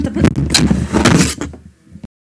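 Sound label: you drop the microphone during recording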